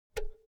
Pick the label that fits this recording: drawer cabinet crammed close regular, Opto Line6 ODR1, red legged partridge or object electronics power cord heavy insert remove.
object electronics power cord heavy insert remove